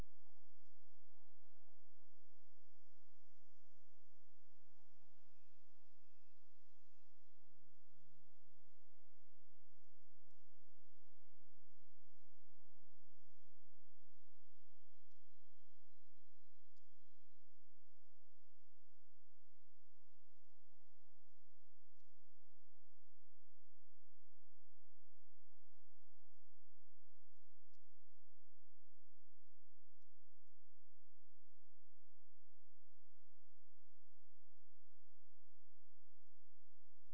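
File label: hydrophone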